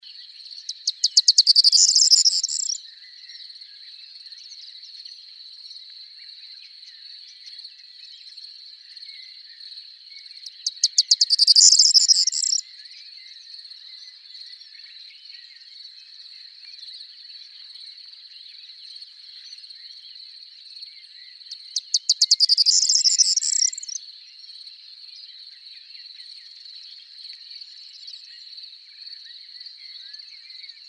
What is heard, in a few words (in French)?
Bruant proyer